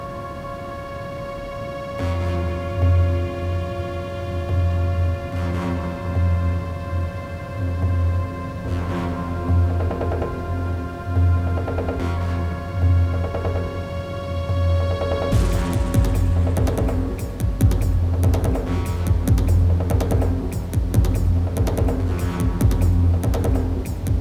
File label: Ambient Skybar Room Lobby; gamerip; Battlefield 3